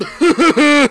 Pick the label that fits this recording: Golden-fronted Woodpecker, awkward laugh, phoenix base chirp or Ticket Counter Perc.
awkward laugh